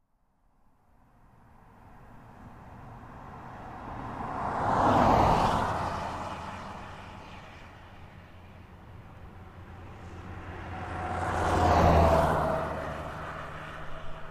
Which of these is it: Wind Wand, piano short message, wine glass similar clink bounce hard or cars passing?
cars passing